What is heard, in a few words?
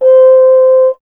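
brass French horn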